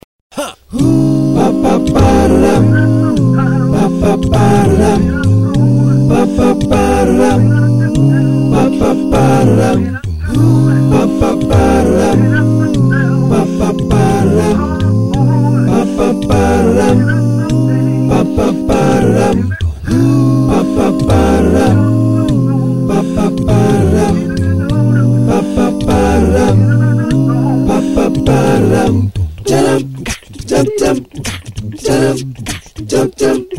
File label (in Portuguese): vocal na madrugada